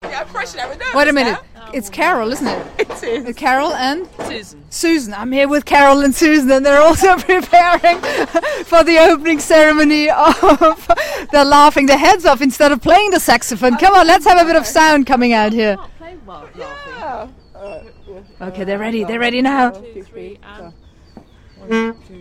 2 ladies their sax

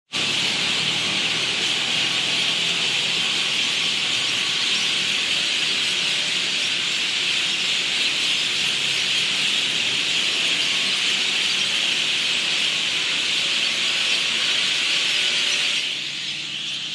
traffic and birds coming in to roost

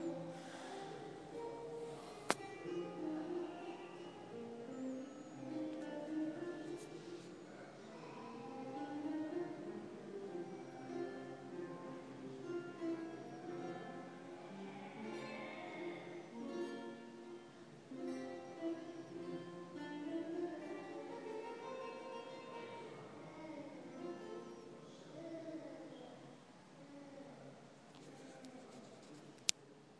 recording of lute player

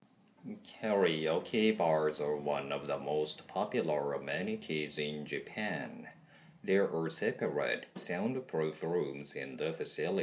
karaoke

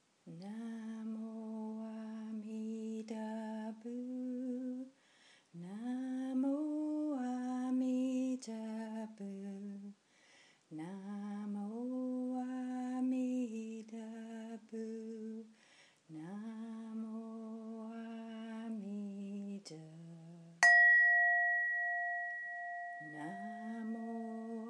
olympic bells and pureland chanting

